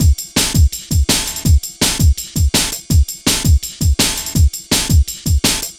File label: Cymbal Steppa, Drum Loops 165.5; Zero-G Jungle Warfare 1, 2 & 3